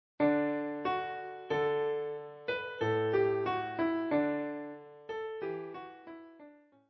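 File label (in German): ABC die Katze lief im Schnee Klavier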